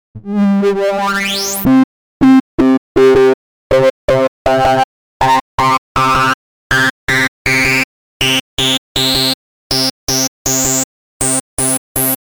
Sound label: Synth